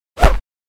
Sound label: Bullet Impact Sweete, body